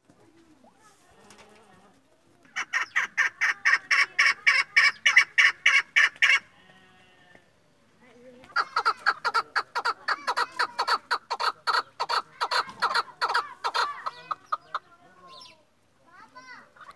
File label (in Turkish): yavru keklik sesi